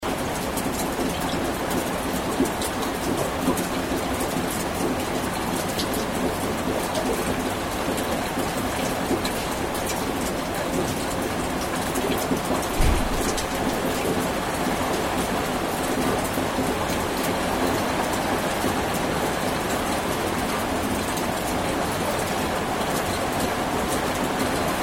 rain; fieldrecs

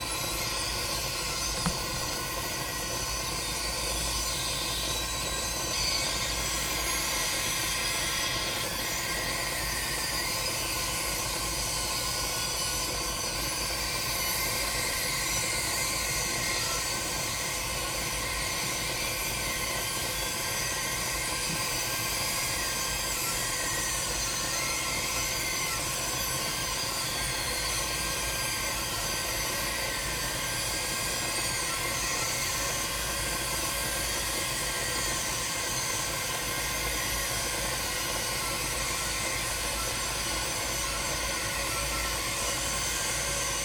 kettle; fieldrecs

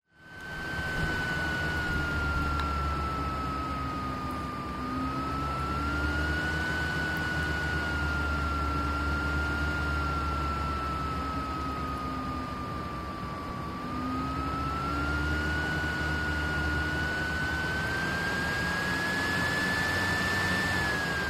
laptop fan; fieldrecs

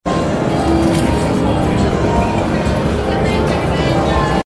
messe frankfurt; fieldrecs

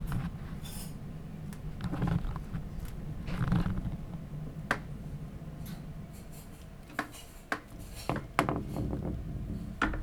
squeaky door; fieldrecs

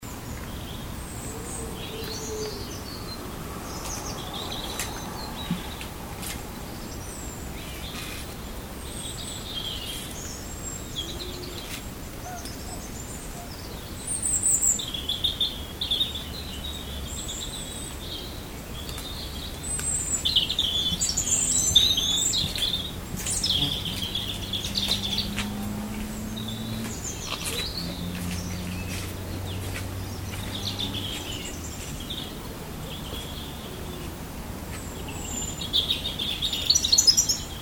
birds; fieldrecs

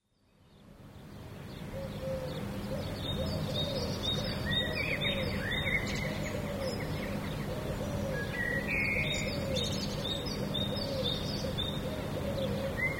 birds - cars - noise; fieldrecs

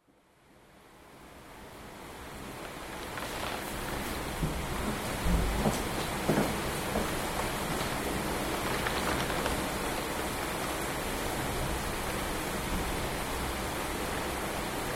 home - rain - rumbling; fieldrecs

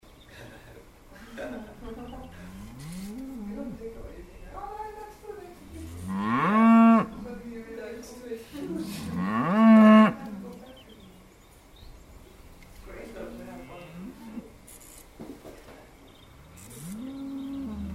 cows; fieldrecs

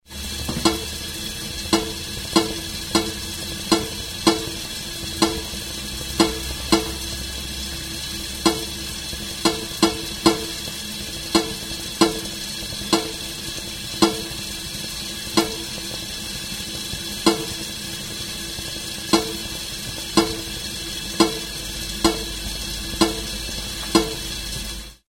kettle; fieldrecs

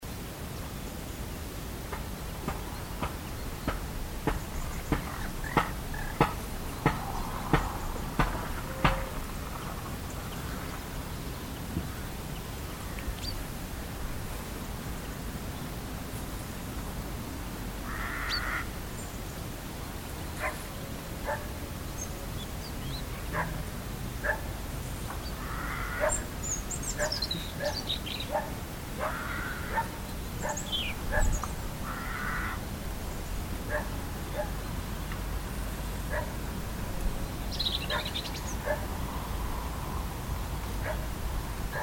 silence; fieldrecs

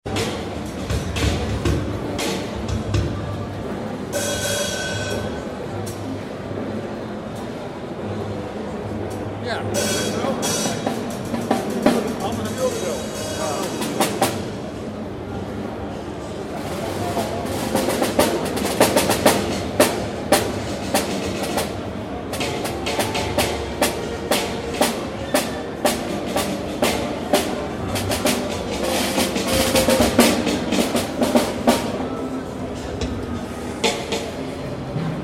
drum - sax; messe frankfurt; fieldrecs

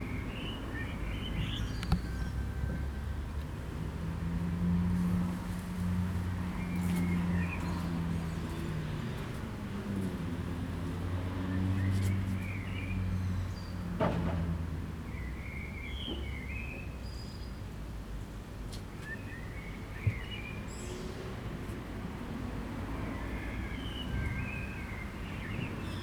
birds and cars; fieldrecs

